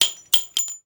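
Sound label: shell bounce large, weapons, shells; ModernWarfare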